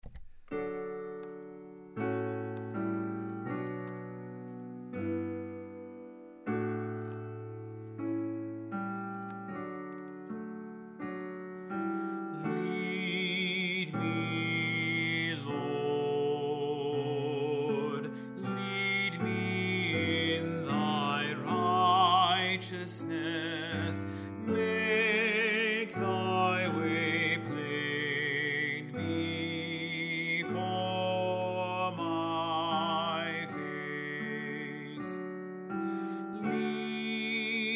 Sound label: Tenor; Lead Me, Lord, S. Wesley